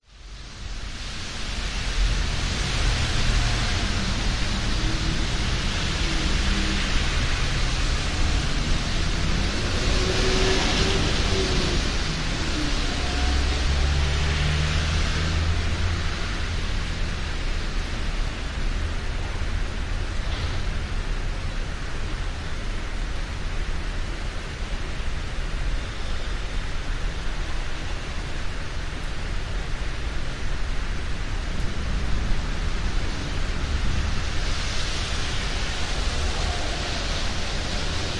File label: vehicles traffic passing wet road industrial estate raining